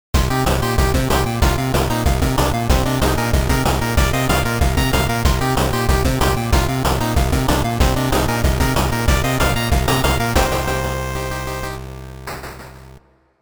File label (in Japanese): ペダルポイントなし